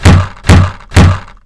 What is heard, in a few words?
thump